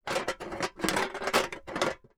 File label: Metal; TOOLKIT vol.2